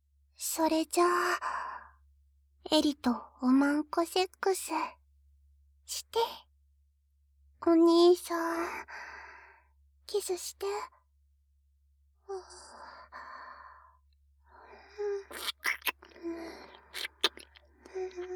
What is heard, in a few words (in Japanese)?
耳舐め 生 セックス; エリちゃんと結婚子作り